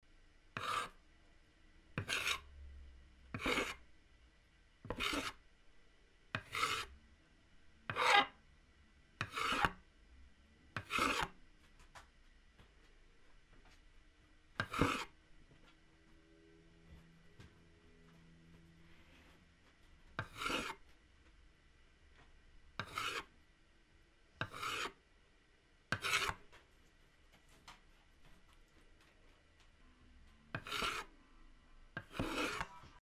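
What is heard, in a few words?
zvuki rezki, cutting sounds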